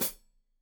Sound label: Hat Foot, Hi-Hat, Cymbals Stereo